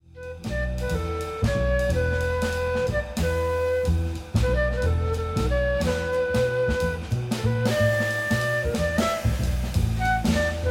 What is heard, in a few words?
spiritual jazz